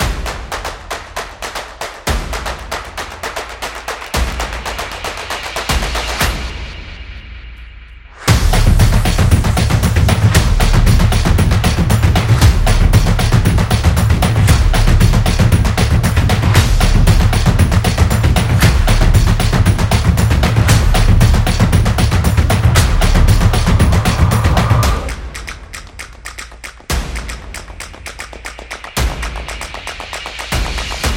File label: Stomp